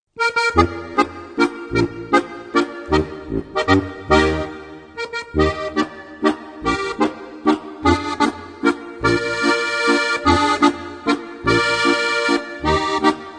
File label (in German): klatschwalzer